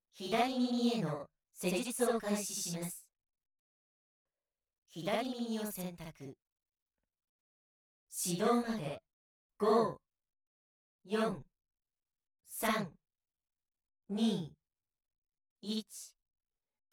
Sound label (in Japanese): 左耳マッサージ; archaic ProX; イヤーキュア